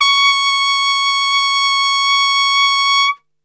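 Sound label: C#4 piccolo trumpet, Brass; Best Service; Brass Super Section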